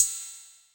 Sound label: Ride, Drum Machines, Yamaha DTXpress, Cymbal